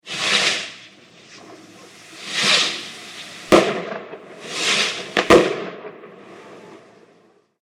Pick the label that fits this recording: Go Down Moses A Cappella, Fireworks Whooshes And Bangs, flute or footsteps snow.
Fireworks Whooshes And Bangs